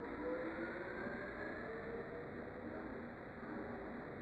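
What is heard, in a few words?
Factory LP, Loops; Yamaha DTXpress-II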